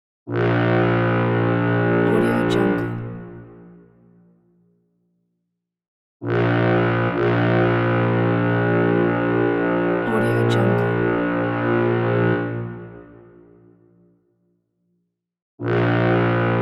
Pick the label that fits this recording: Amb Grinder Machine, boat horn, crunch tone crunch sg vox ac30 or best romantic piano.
boat horn